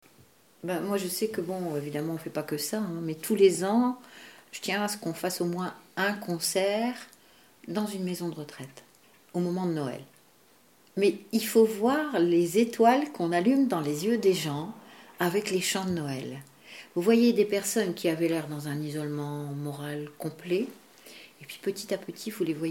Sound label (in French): chorale